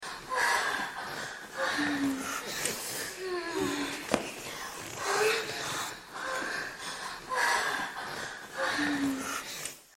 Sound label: Moan